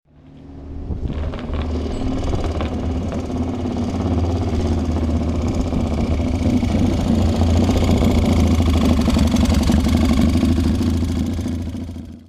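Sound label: Motorcycle engine sound M-80; Zvuk dvigatelya motocikla M-80; Motomir Vyacheslava SHeyanova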